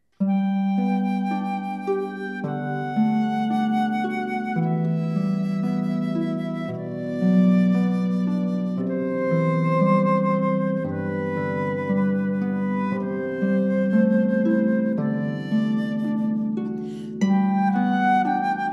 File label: canon in D, Harp, Violin & Flute; Serenade